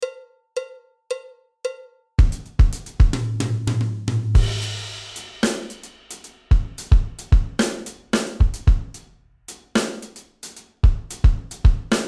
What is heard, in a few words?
linear funk fusion, drums